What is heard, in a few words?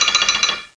Rattle; SoftKey